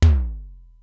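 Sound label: Simmons SDS-9 low tom, Drum Machines; Factory D2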